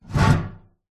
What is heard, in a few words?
industrial toolbox metal large empty short movement slide scrape concrete